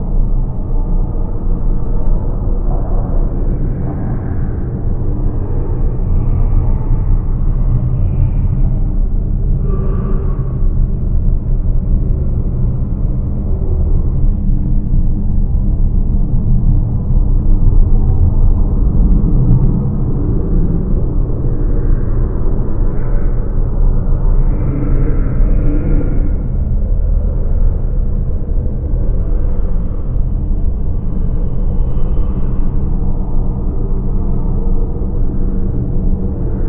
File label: Scare Guest House Premonition; whispering willows